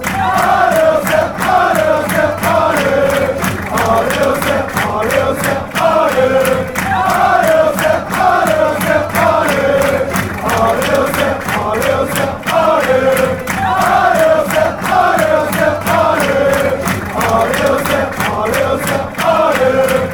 come on come on, chants; auxerre

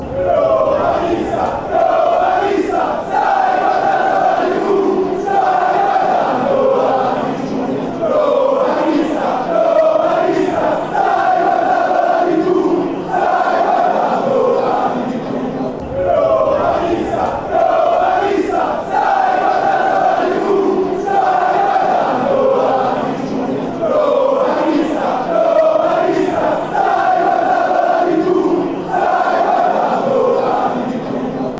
lazio chant

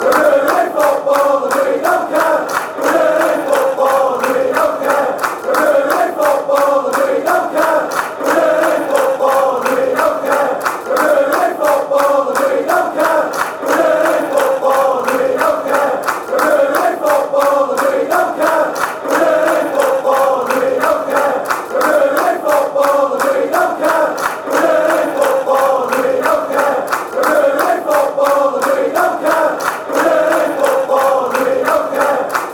were ruining football, fan chants

